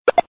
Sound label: kick